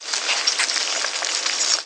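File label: ice death; GZDoom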